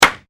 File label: Clap single, hand sounds; Applause; Human